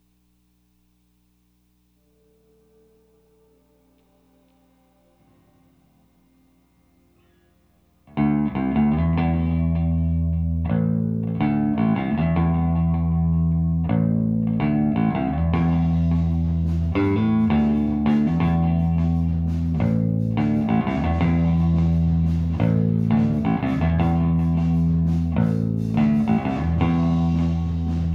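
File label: SM57 mono Bass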